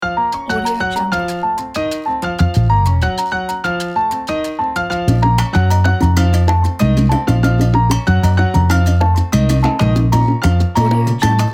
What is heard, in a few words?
salsa radio